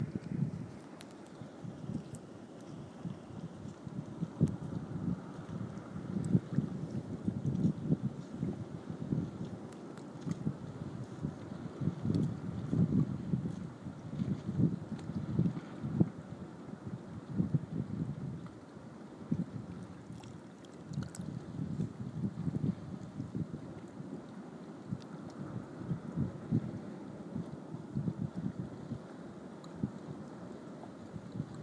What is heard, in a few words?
rock pools in western cyprus